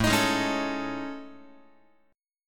A Major Sharp 11th, Guitar; AM#11 strummed

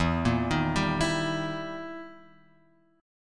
E Minor Major 7th Flat 5th, E; EmM7b5 Chord {0 1 1 0 X 0} arpeggiated

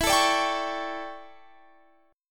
D Major 7th Suspended 2nd Suspended 4th, chord

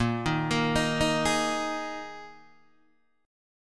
A sharp 7th Suspended 4th down Guitar DADGAD

